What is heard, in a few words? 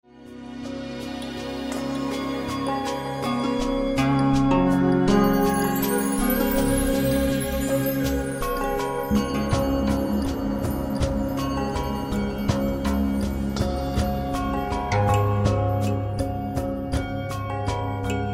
zen far east sounds